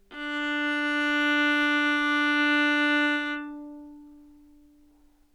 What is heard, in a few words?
baroque viola, Anechoic; OPENAIR